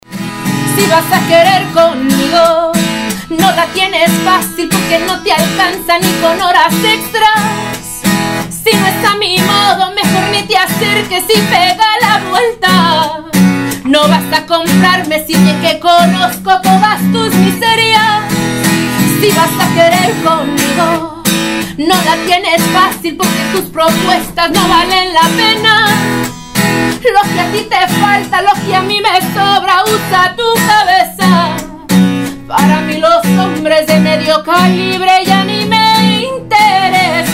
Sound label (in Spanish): cantando en vivo